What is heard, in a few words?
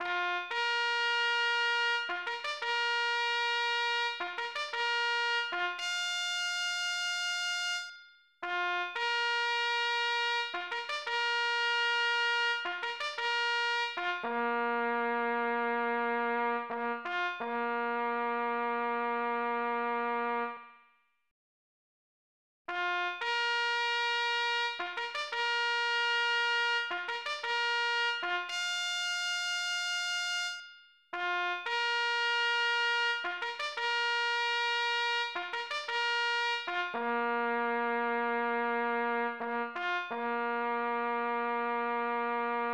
Failure; Daisenryaku Expert; SNES